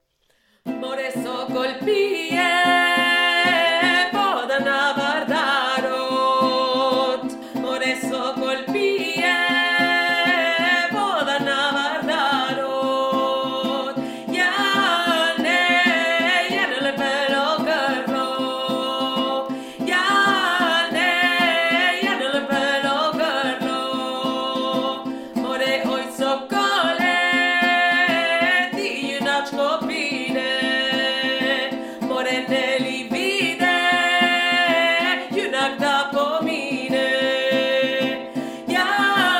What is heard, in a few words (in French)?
VOIX PRINCIPALE